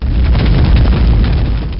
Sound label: Skill fire bomber; FlyForInsanity